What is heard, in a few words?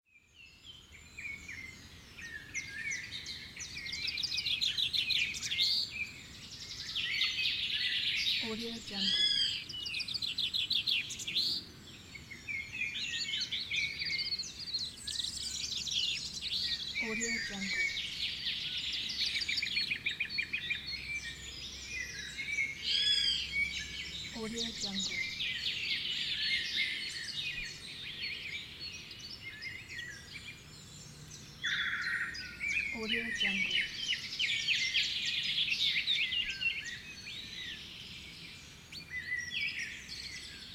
birds